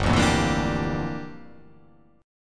G Minor 13th G down; Gm13 strummed; JGuitar

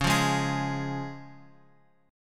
C sharp Major; C# Chord {X 4 3 1 X 4} strummed

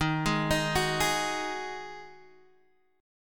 Eb Minor Double Flat 5th, down, Guitar, DADGAD

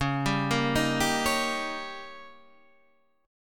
Eb 7th

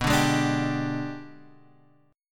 Ab Minor Major 7th Flat 5th

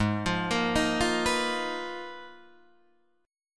G sharp Minor 6th Add 9th, Guitar DADGAD

